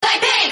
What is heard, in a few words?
marimba remix